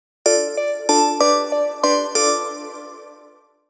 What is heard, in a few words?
robotic bells